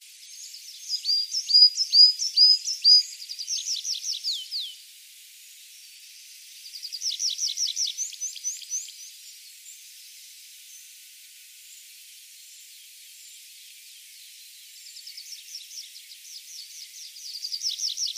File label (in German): Baumpieper; Ornithologie